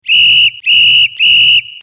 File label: whistle